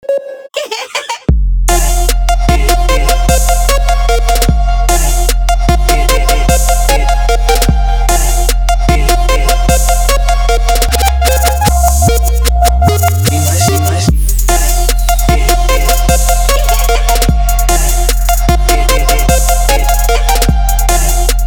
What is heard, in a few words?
monster trap ringtones